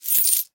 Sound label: sell buy item; Zombies Layer Viking